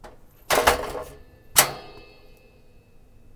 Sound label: stopping a bicycle